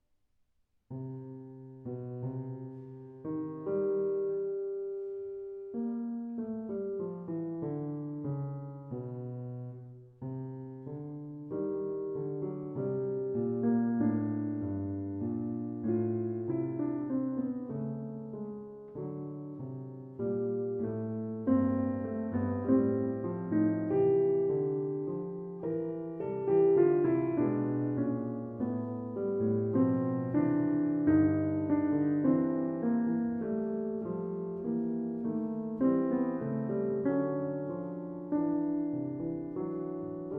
Fugue in C Minor, Alternative Three-Voices Exposition; Completed by A. Simonetto